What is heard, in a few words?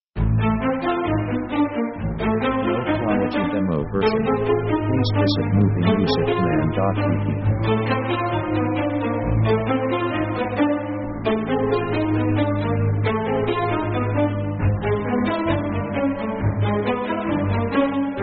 orchestra traditional suspense; orchestra themes